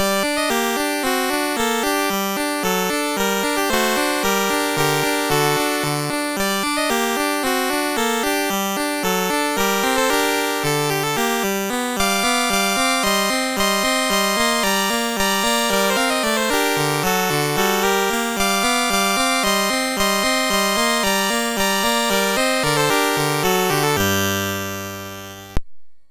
Andante from Toccata in G Minor, MOS6581R2

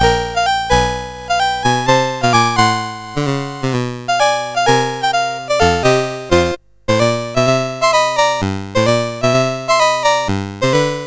CSG8580R5

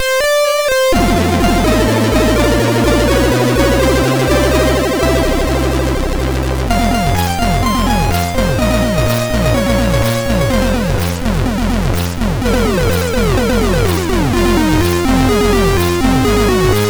sid, MOS6581R3; Castle Boy

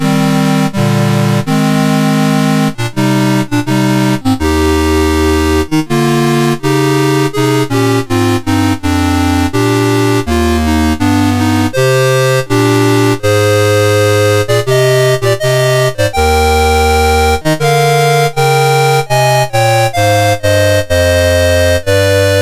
Farandole, MOS6581R4

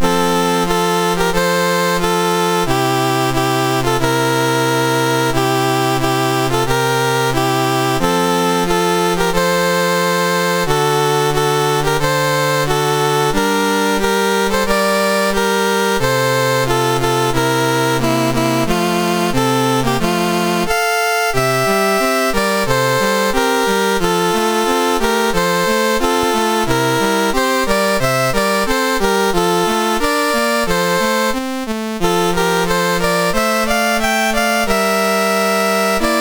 MOS6581R3, National Anthems; New Antilles

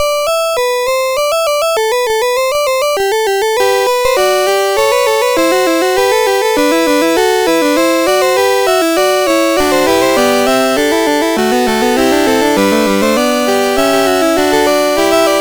Fugue, MOS6581R3; Fugue VIII 6

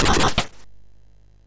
sid MOS6581R2; California Games

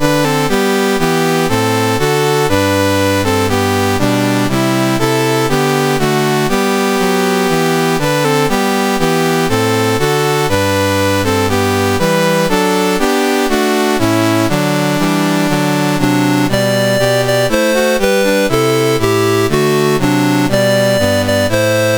Barbados, National Anthems, MOS6581R2

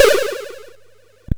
sid MOS6581R4

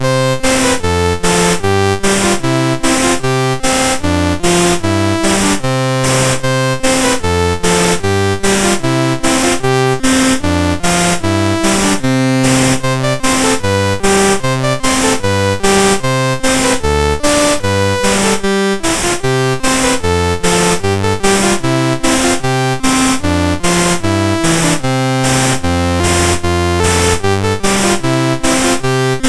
Country Gardens, MOS 6581; MOS6581R2

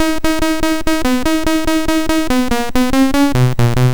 BASIC sid MOS6581R2; Auf Wiedersehen Pet; hvsc

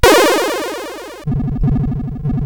Die Alien Slime, CSG8580R5

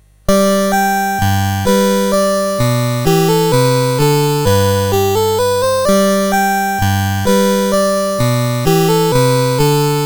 Minuet English Gigue, CSG8580R5

